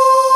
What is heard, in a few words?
BOTTLE VOX; SONIC ELEMNT; SONIC EL YES